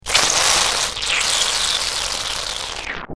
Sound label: blood spray